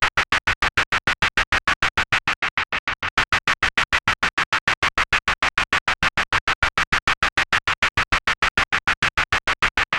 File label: rhythmic wet slime